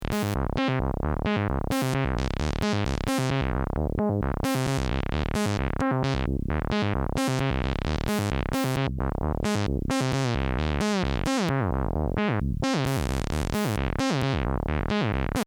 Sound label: ARPody knarz